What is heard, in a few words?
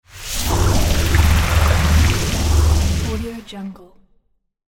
energy stream; futuristic sounds